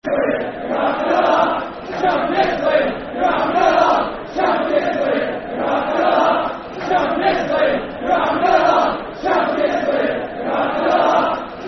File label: Champions League Laugh Football Chant (Soccer Song); Stockport County Scfc